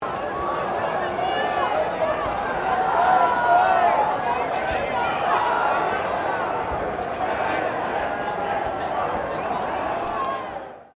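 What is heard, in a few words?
crowd 40K Melbourne Cricket Ground heckle low, Cricket at Melbourne Cricket Ground; Crowds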